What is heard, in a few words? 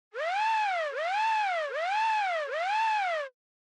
Police Car Siren Fake Fast